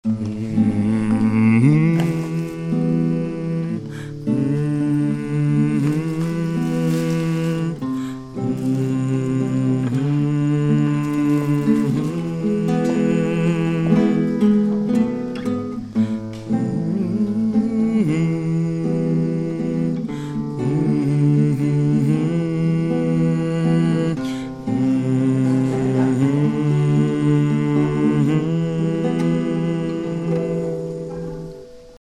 adon olam bas; Oefenen; Jiddish ensemble TOCH

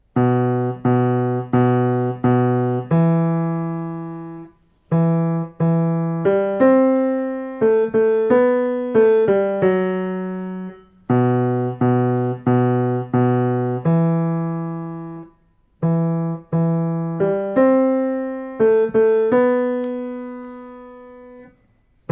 bas piano; Shier noded bas piano; Oefenen; Jiddish ensemble TOCH

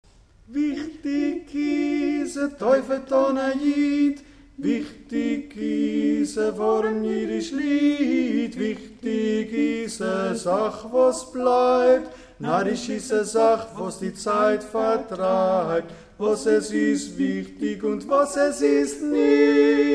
bas; vikhtik

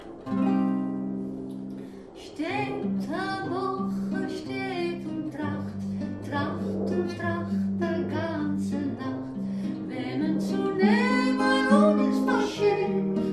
tumbalalaika

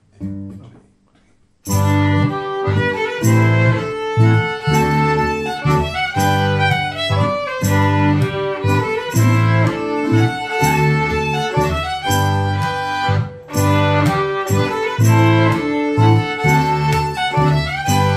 jamtpolska; efter katrina

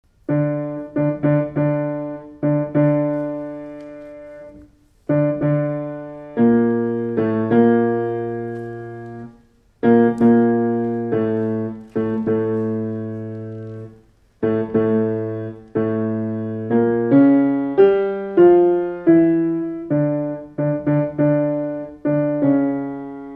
piano; haolam bas piano

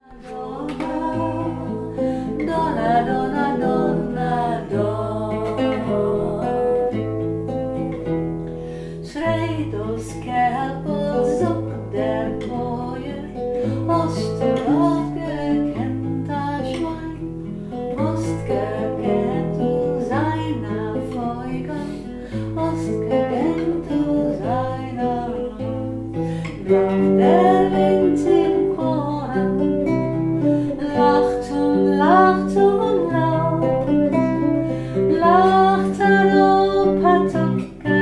harmony

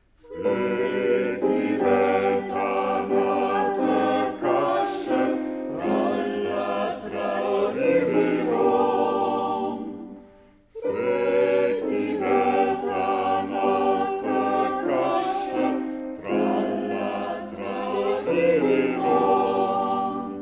piano; kasche; Jiddish ensemble TOCH

